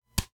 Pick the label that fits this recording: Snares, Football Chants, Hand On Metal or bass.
Hand On Metal